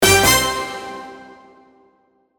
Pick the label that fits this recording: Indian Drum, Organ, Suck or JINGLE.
JINGLE